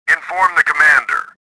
victory; swamp

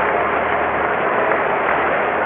crowd; TotalImmersionRacing; XBOX

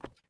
fs wood, footsteps

